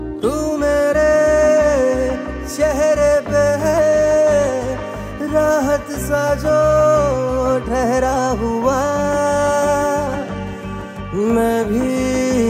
Sad song

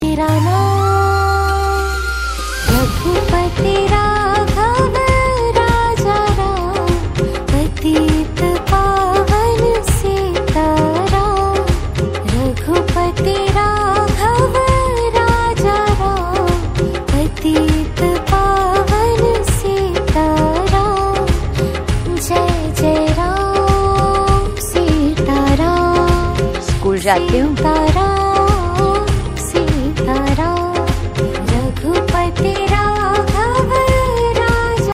Bhajan

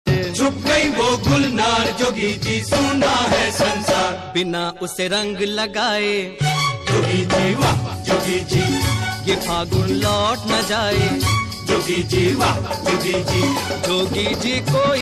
Holi Special

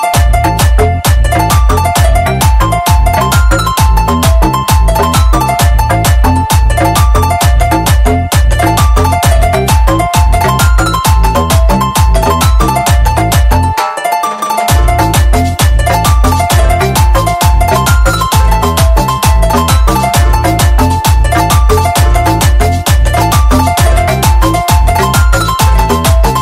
DJ Music Song

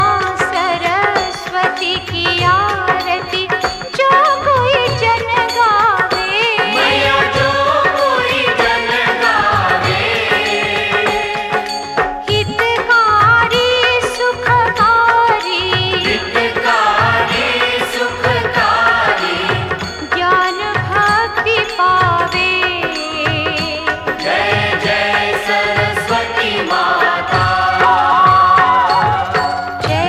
Aarti

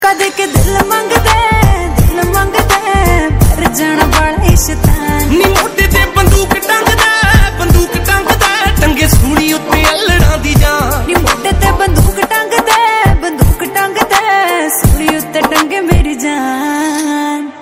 Panjabi song

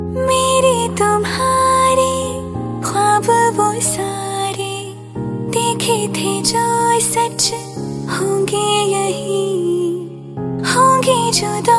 Female Version

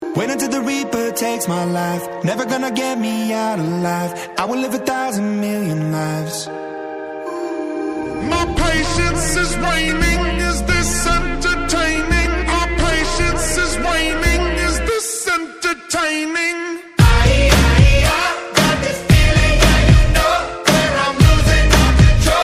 English song

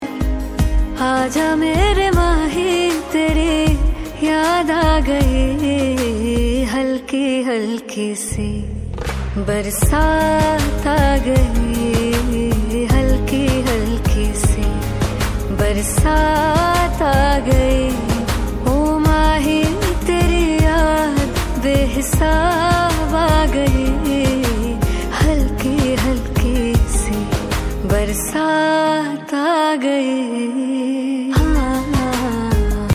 Female Version

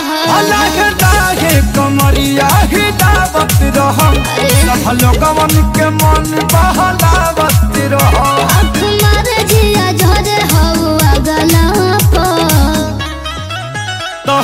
Tabla song

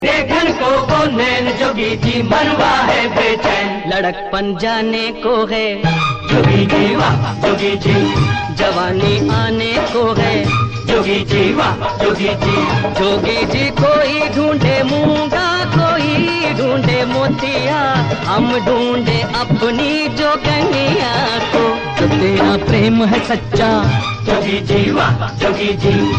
Holi song

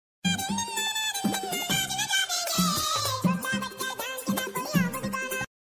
Cute Baby

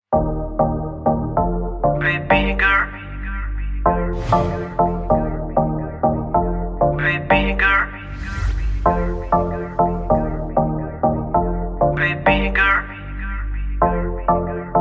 Baby Girl Beet

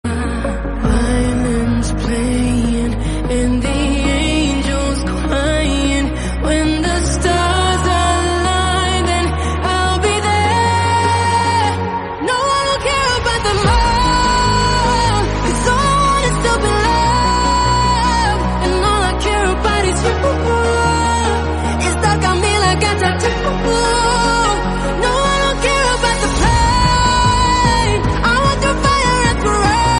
Violins Playing

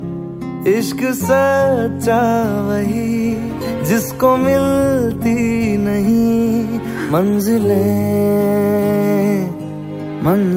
Sad song

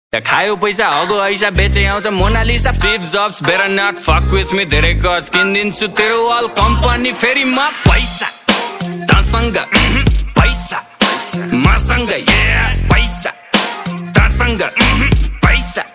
Trending Song

